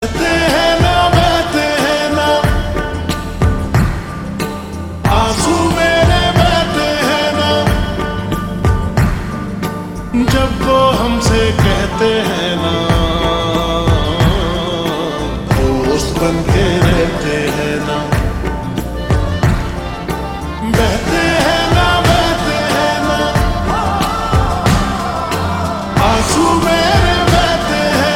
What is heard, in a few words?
Ringtone Slowed Reverb